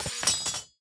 minion battlefoley metaldrop; smite; npc; roman archer

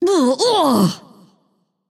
ugh; smite; voicepack; medusa

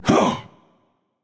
breath; smite; ra

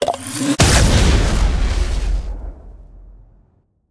activate; smite; baronsamedi skin7

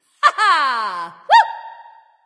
"yay"; smite; nuwa

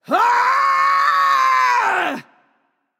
battle cry; smite; aphrodite

